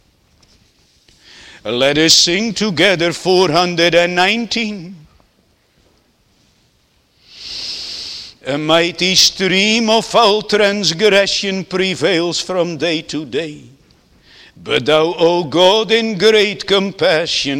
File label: Singing; isaiah 25 9